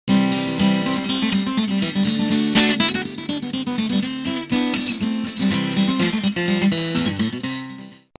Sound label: Accoustic Guitar